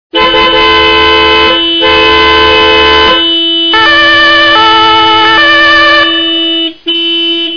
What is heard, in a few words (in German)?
martinshorn